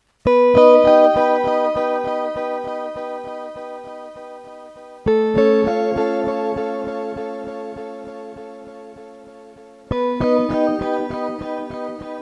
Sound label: mystery guitar sample